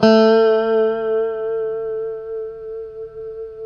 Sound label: A JC120; Spectrasonics; Hans Zimmer Guitars VOL-1; JC-STRAT MF